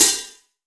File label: Low Treble Pedal Hi-Hat Sample A Key, Royality free closed high hat sound tuned to th